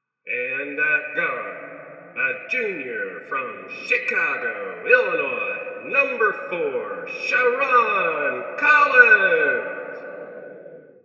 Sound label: Announcer Wood Blocks